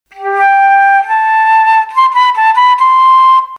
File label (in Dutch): fluitmelodien